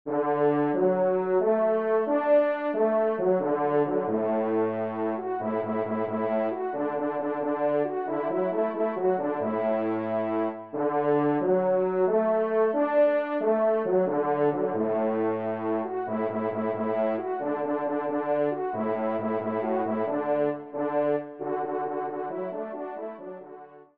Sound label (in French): pas redouble, trompe; La Bidart